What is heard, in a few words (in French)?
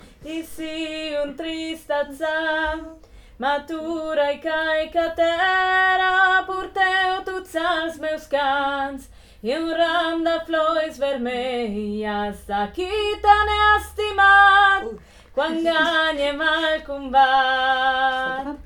refrain sopranes